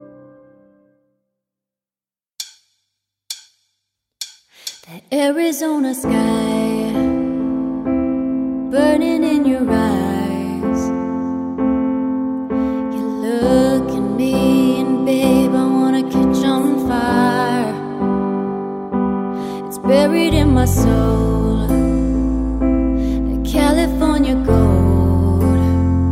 영어여 노래

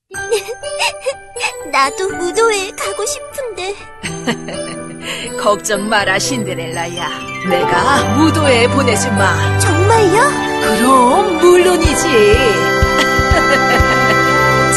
창작동요

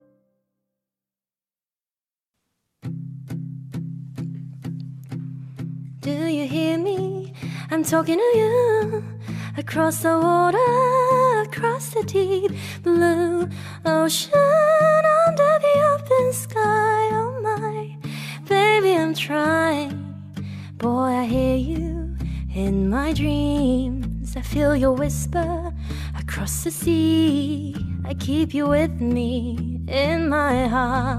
영국여 노래

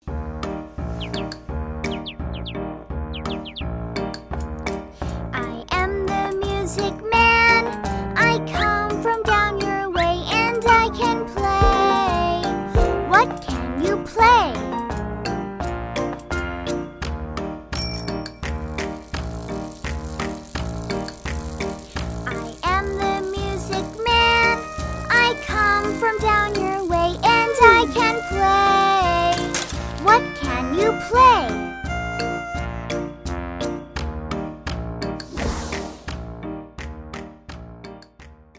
영어 여 여아 동요

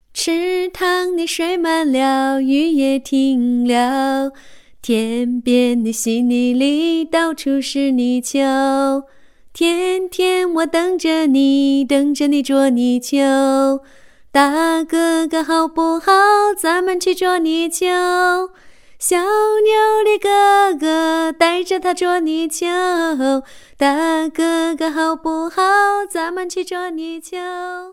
중국여 노래

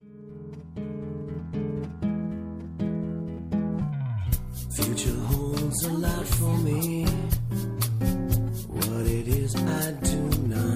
영어남 Song

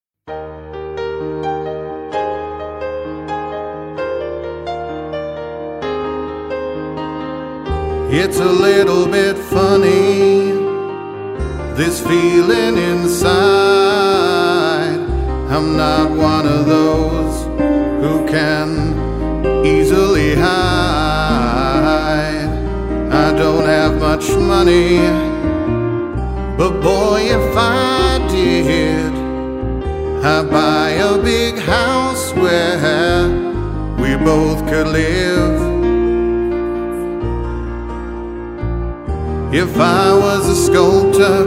영국남 노래

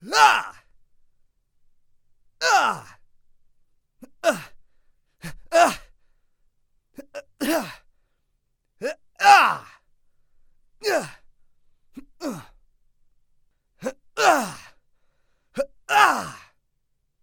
영어남, 호흡; 남자

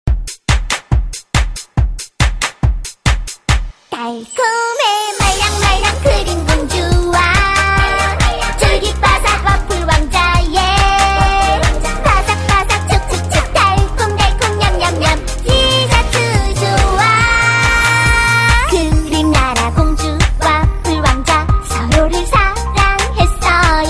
창작동요

